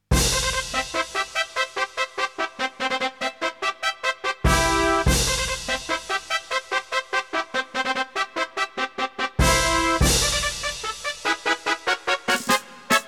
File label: Fanfare of the Race; Winning Post